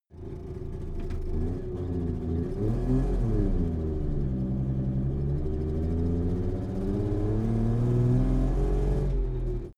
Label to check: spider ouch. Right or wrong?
Wrong